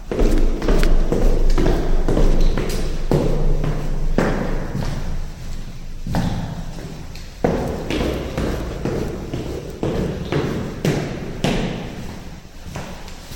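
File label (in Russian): мужские шаги спускающиеся по лестнице на лестничной клетке автостоянки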